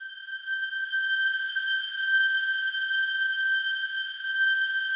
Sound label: slow strings G5, Yamaha VP-1; 90 s Sample CDs; Music Store